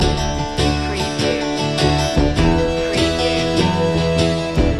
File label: circus loop